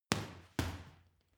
boxing punching bag uppercut double hard

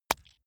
leather impact mud hard

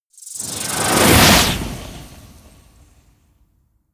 swoosh

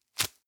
velcro open fast

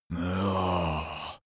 zombie moan idle

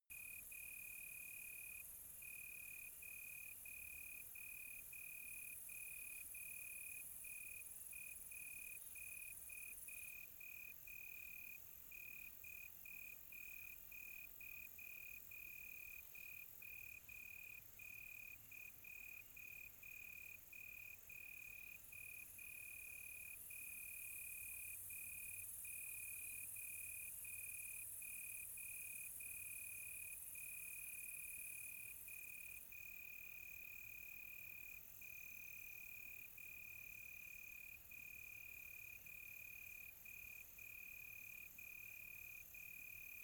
quiet tropical night close crickets

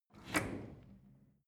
drawer cutlery open draw short

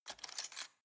cutlery plastic drawer grab

